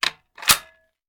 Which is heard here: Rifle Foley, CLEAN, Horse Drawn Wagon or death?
Rifle Foley